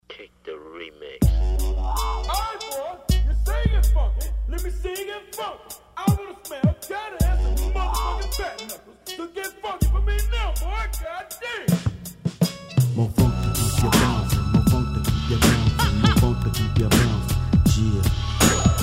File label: MO FUNK REMIX